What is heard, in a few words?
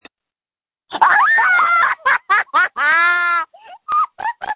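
screams that feature laughter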